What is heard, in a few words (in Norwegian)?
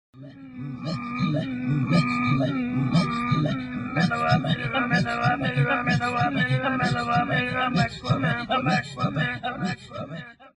Inuitt Strupesang